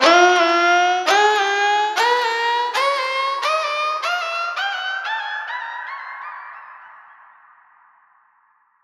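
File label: creepy atmosphere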